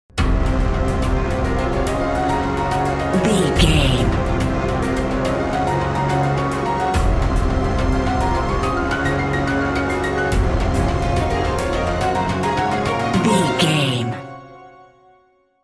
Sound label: facing enemy bumper